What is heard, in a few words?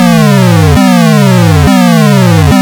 Background; Galaxian